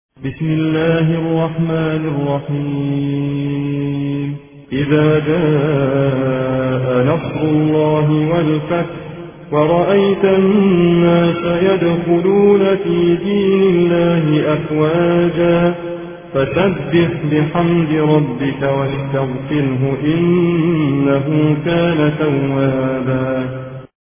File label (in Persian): ترتیل روایت حفص عن عاصم; سوره نصر